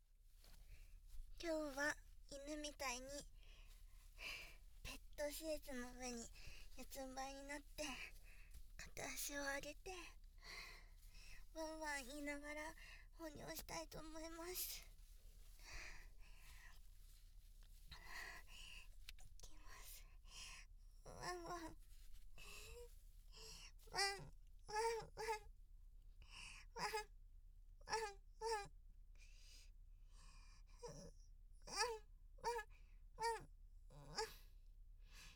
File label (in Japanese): 犬ポーズで ワンワン言いながら オシッコですッ バイノーラル